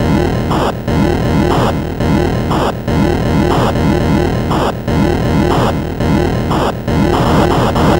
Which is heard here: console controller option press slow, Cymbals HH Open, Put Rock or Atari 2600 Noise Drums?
Atari 2600 Noise Drums